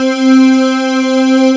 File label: FINE C4, Roland, Analog; USB Soundscan vol.49; Analog Fantasies; AKAI